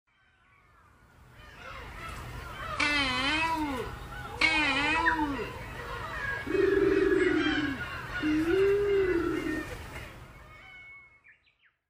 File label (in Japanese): karasubatos; sakata